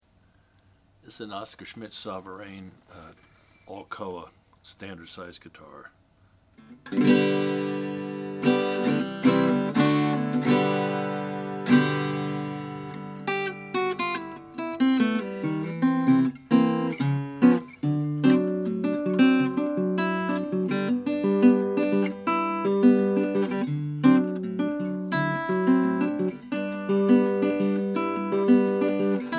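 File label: oscar schmidt sovereign koa, Guitars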